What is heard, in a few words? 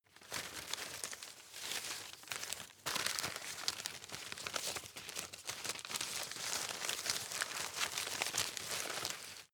rustle medium long; berufe; foerster